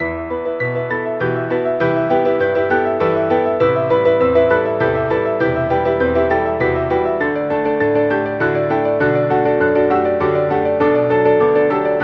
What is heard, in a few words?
polska piano